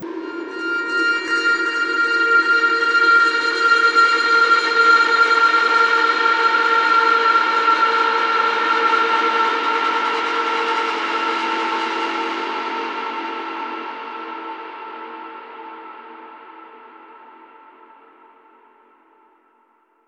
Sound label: Stinger Combat FX Tone